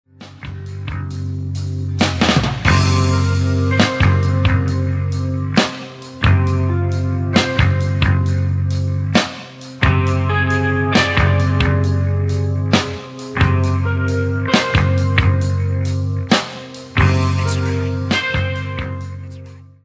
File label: crunch, Amplis Mesa Boogie; For this I used the Rhythm sound on channel 2 of the / Quad preamp